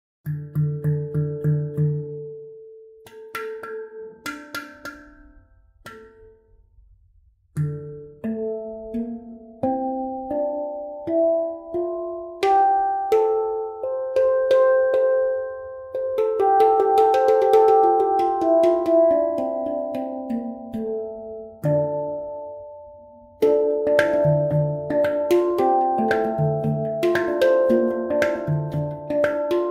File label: handpan 10 notes black meteor d minor